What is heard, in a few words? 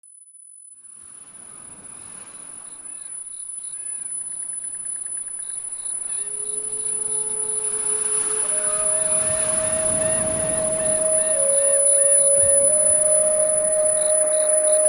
10.000 Hz Cure All Frequency